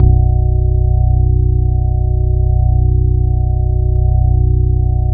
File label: ROTOR BASS, Bass Analog & Synth; USB Soundscan vol.12; AKAI